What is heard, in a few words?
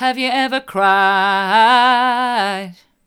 have you ever cried hard, vocal